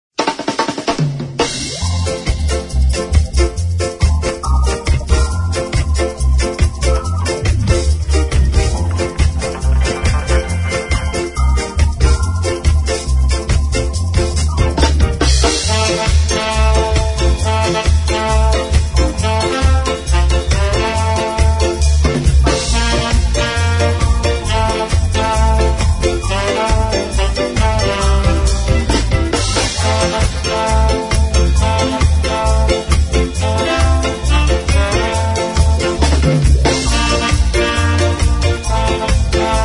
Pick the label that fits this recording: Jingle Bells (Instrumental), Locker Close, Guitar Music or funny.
Jingle Bells (Instrumental)